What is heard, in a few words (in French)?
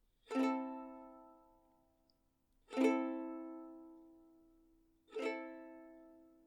Ukulele Am Accord